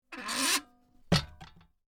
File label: Safe Door Close, Small; Miscellaneous Doors